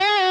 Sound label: Bend full Release